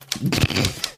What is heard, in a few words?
snorting